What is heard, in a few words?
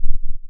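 ring modulation tri sin, env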